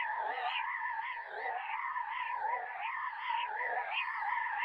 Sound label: Balloon Move Loop